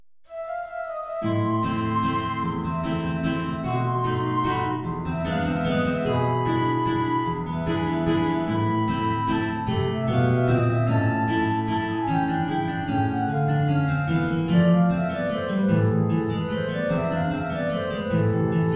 Querflöte & 2 Gitarren

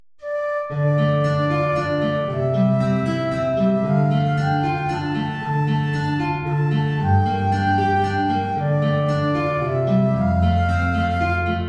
Querflöte & Gitarre, hoch; Es kommt ein Schiff, geladen

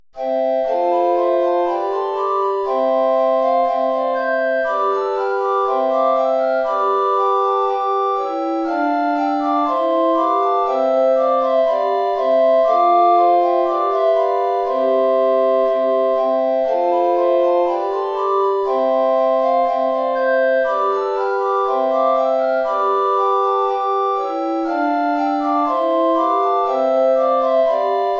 Bourree I (5 Blockflöten) (SAATB); tiefe Notierung

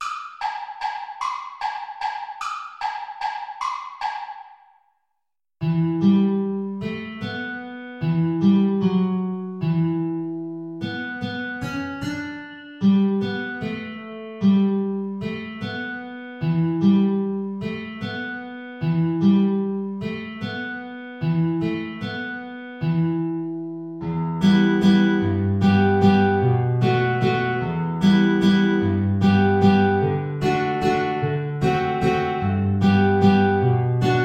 2 Gitarren; O Heiland, reiß die Himmel auf; Play-along

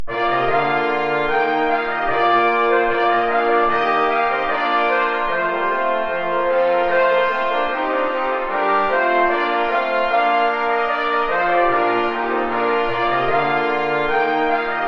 Ballet des baccanales (2 Trompeten in C & 2 Posaunen)

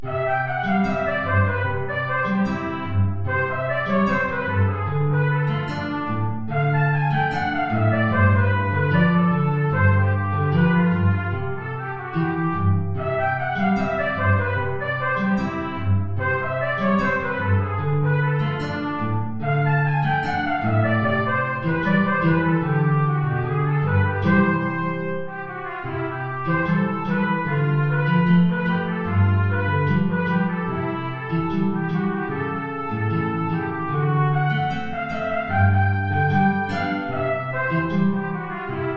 Trompete in Bb Gitarre

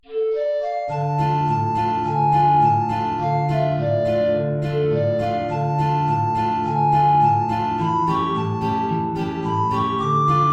Altflöte & Gitarre, tiefe Notierung

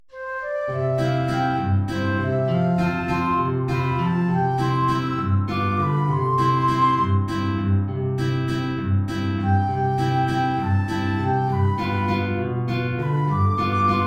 Querflöte & Gitarre, C-Dur

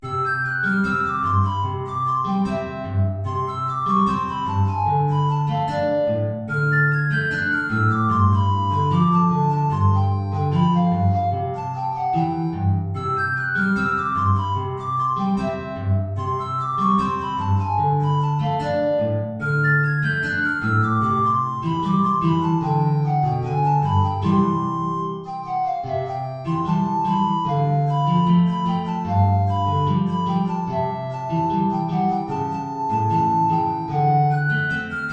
Sopranflöte & Gitarre

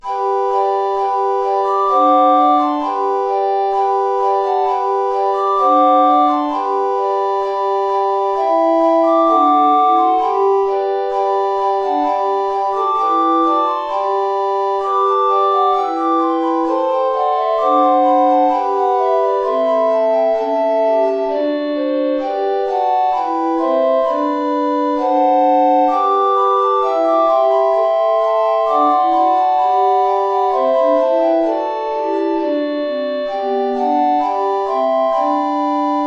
4 Blockflöten SATB tiefe Notierung; Hört, der Engel helle Lieder

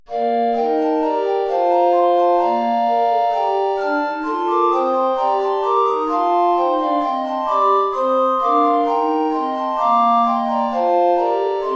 Ballet des feus (4 Blockflöten) (SATB); tiefe Notierung

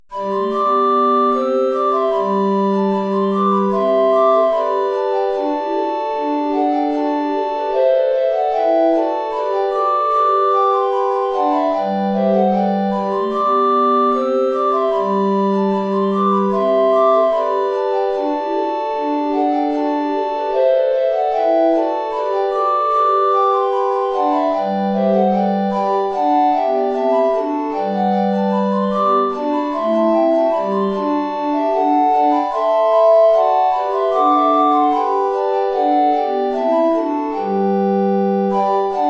Ballet (4 Blockflöten) (SATB); hohe Notierung; Ballet des baccanales

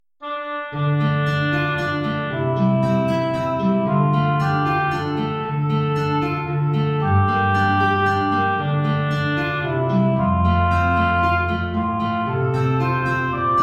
Oboe & Gitarre; tief; Es kommt ein Schiff, geladen

